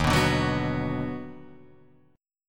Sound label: Eb Minor Major 7th Sharp 5th Eb down Guitar Half Step Down, chord